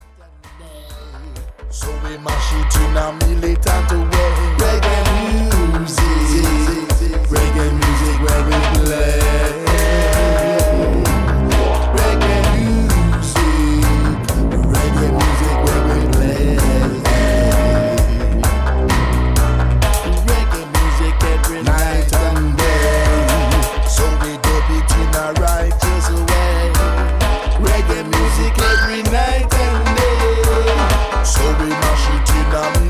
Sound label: Reggae Music